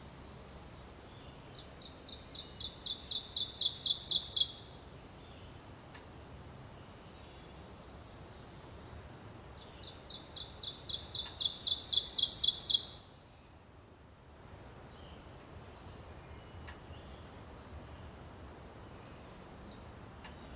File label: Ovenbird; Milboune Worcester